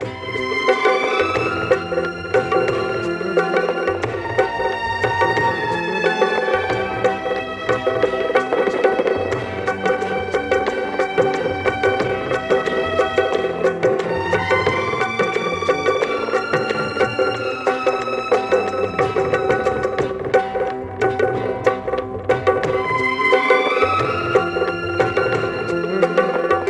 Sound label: Orchestra Final; dying light gamerip 2015